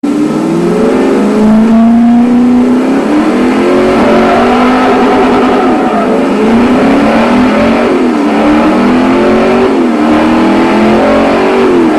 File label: Camaro Drag Racing